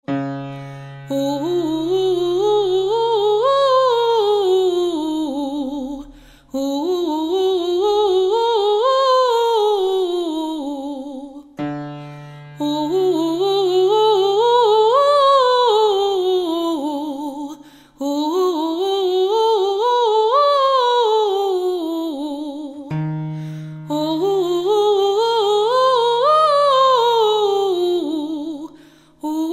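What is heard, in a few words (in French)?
saut de tierce soprano; Exercices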